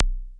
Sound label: Korg ER-1, drum machine